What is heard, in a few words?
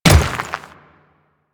Royal Guard Dirt Step, Footsteps; Warframe; Grineer Royal Guard